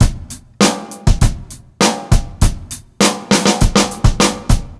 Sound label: beat, Roots Funk; USB Soundscan vol.41; AKAI